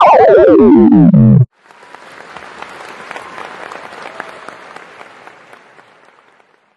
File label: lose melody; sudoku 2